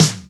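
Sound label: SP-12, drum machine; Best Service Gigapack 2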